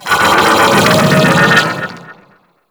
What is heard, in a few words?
Vocal Death; Warframe; Amalgam Override